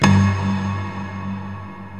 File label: STEEL DRUMS